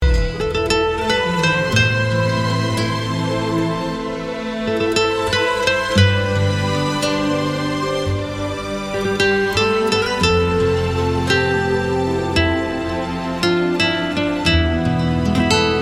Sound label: Guitar